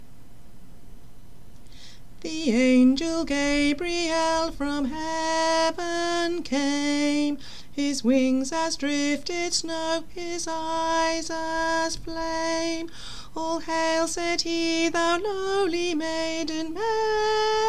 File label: alto; Angel Gabriel; Christmas